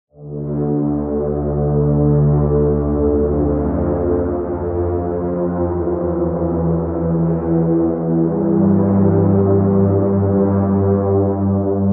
Pad; Routed Sound; Spire Expansion - Chaos Bringer Vol.1

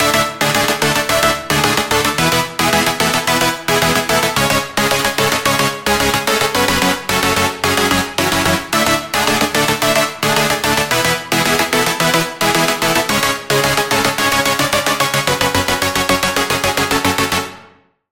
Chord

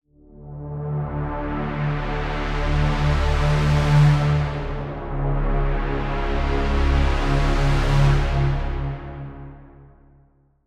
Pad; Routed Sound; Sylenth1 Expansion - Reality Maker Vol.1

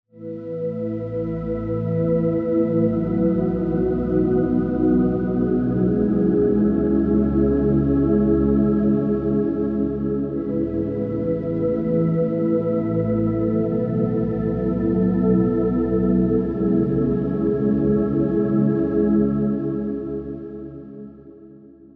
Pad; Flame Of Dawn; Routed Sound; Vital Expansion - Flame Summoner Vol.1

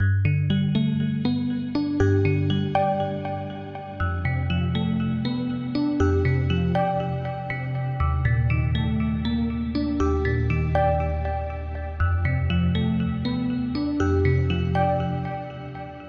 Key

